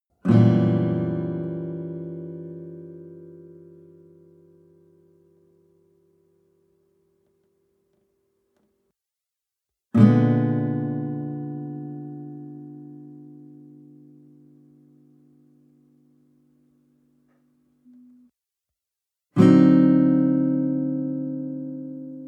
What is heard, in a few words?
western guitar chord full diminished